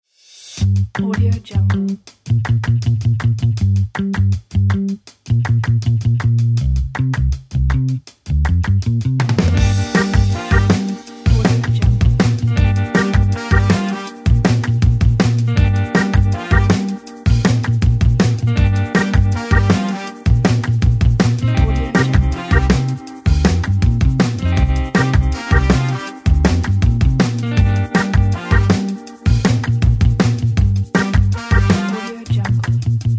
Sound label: funk music